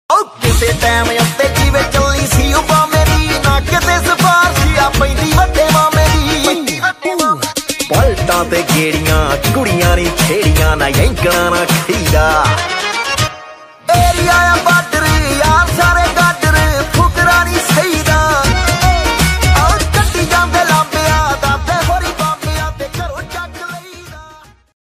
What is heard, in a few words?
Desi Punjabi Ringtone